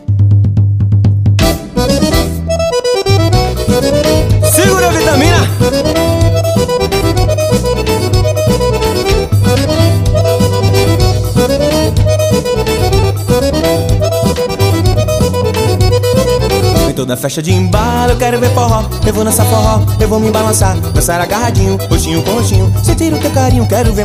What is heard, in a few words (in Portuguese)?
forro pesado